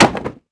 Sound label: bouncy wooden chair hit; bouncy objects; Tony Hawk's Project 8; XBOX